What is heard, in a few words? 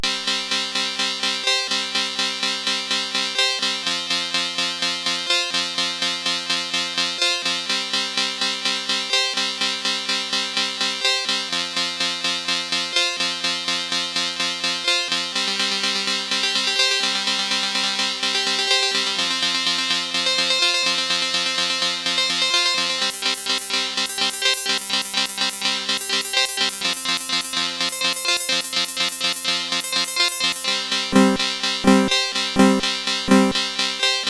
Guitar Flan, CSG8580R5